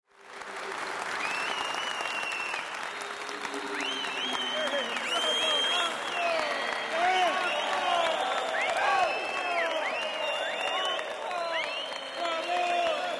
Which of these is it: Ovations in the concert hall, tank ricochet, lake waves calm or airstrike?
Ovations in the concert hall